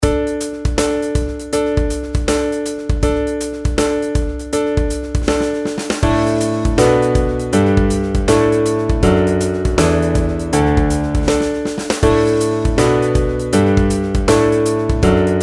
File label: pitch patterns skipwise